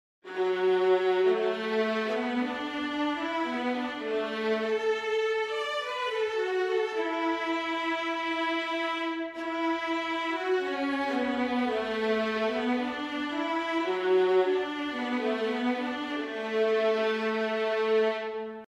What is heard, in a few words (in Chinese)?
中提琴独奏; 中提琴（是指提琴族中的中音乐器）